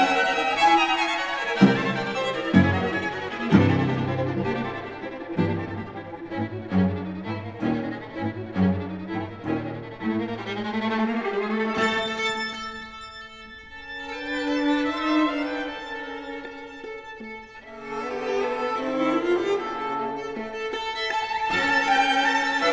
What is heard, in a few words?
classical